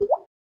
dewdrop touchrelease, phonetones, ui; LG G Pro 2 D838

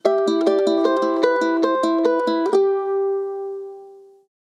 sms guitar; Mudita Pure

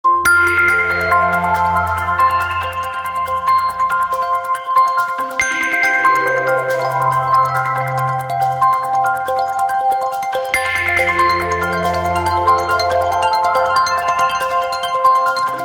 Waking city, ringtones; OnePlus 12

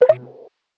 resume call; Netgear; SPH200W; Skype WiFi Phone

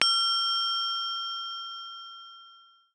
Singing Bowl; Samsung Galaxy Watch 8

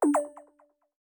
Silent mode off Fun; Samsung; Galaxy A52s

